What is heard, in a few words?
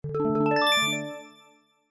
completed; message tones; mission accomplished